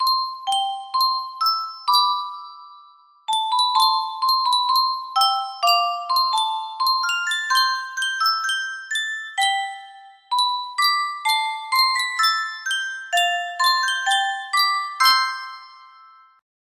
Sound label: yunsheng custom tune music box, music box melody